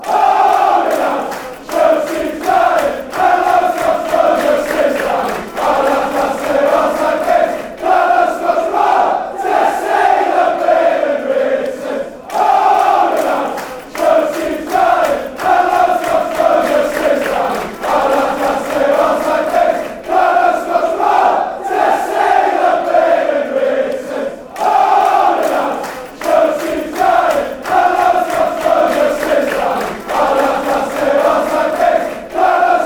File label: blaydon races chorus only, fan chants